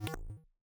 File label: end turn; tanks of freedom